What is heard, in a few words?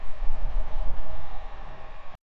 ice, sound-effects